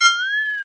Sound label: watery bendy bell; Weird and Interesting Hits